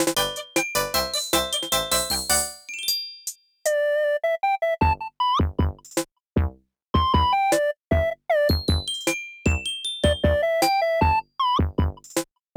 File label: Aircheck, Retro